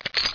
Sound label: bullets, weapons